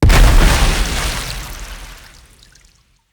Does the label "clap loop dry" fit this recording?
No